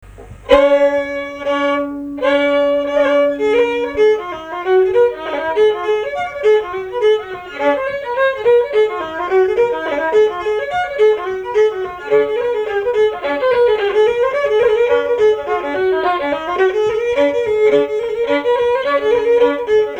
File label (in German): reel D dur